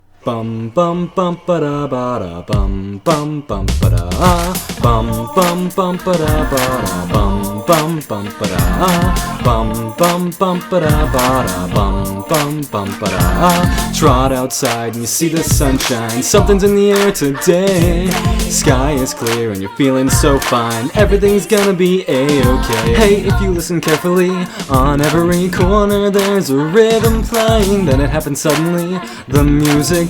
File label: Vocal Cover